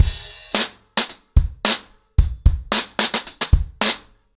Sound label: 110BPM, ACOUSTIC DRUMS; The Roots Drumloops